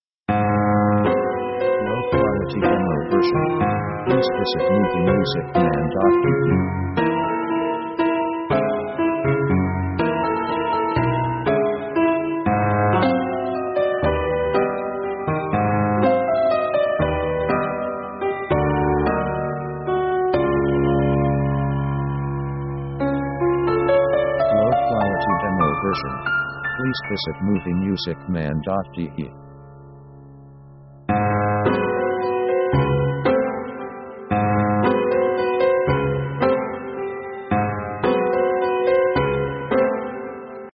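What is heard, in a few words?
piano strange 6 8 sketch; orchestra themes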